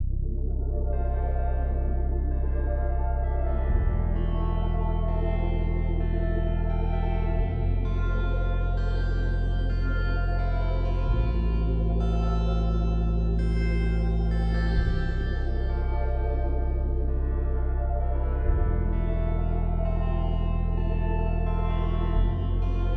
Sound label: Lift Music; MiSide Soundtrack